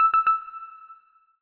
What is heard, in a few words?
warning, button